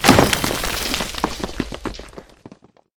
break stone; civ13; civ14

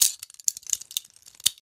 cuff takeoff start, Handcuffs; civ13; civ14; Items

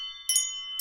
bells, footsteps

